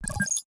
dialogue start

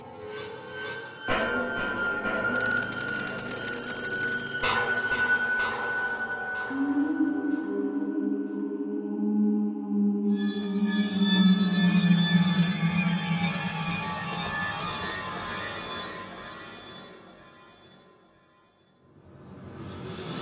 Puzzle Loop

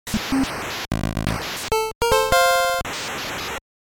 Space Radio Jingle; Maniac Mansion

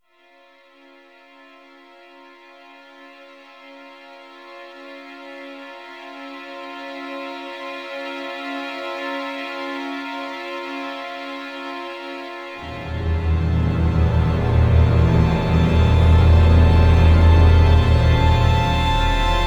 tunnel walk; Blacksite Area 51

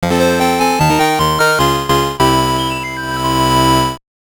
Bonus Hit

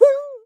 frozen vocal; Scrat

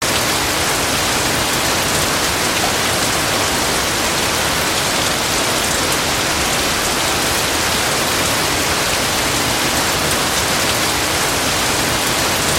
ambient rain

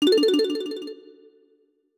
Find 5-Banana Coin; Donkey Kong 64

